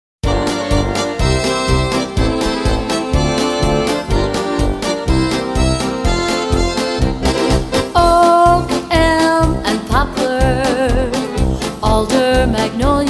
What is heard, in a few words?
Polka